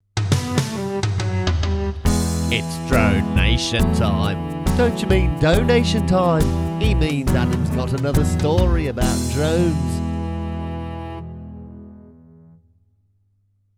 Jingle; Drone Nation

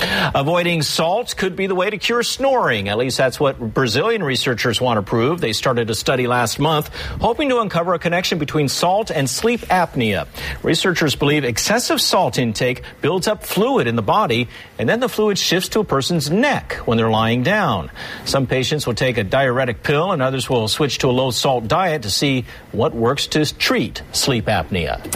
SNORING; BRAZIL

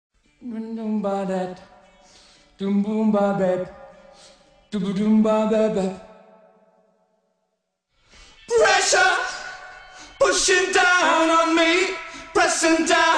A-Capella Only Vocals

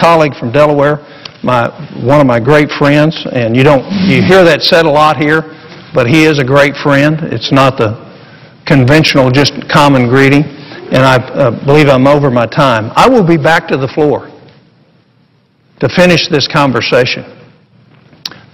applause; Coburn

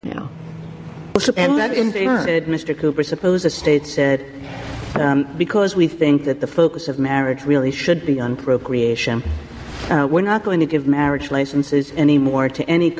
big laughs; scotus

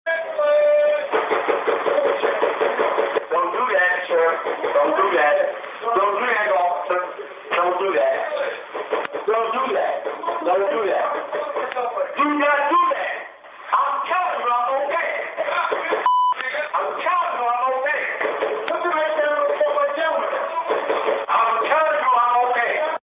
COPS POUNDING DOOR

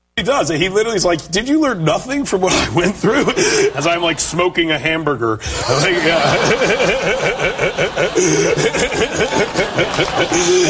laugh; seth rogan

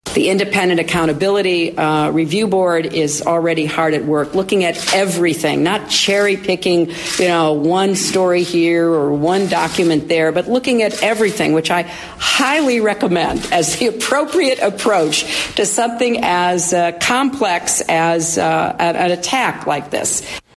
laughing; hillary

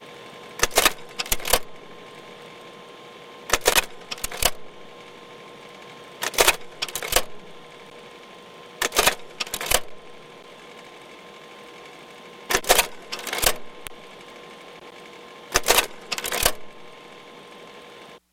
slide projector

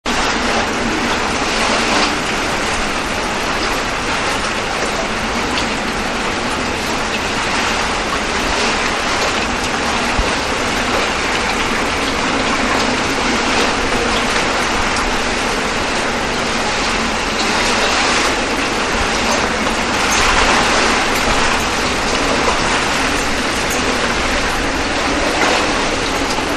wind battering loop; Irene

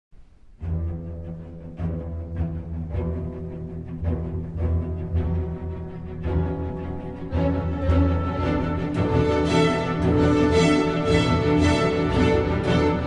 Mephisto Waltz No. 1 (Orchestral Version); Franz Liszt

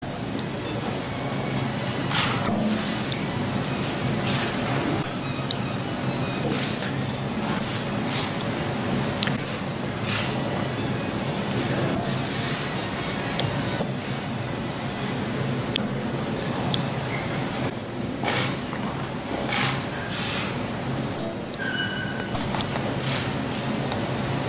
violins; Obama

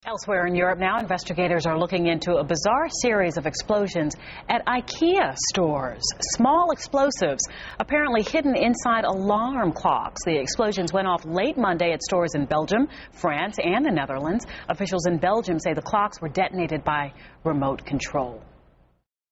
IKEA Alarm clock bombs

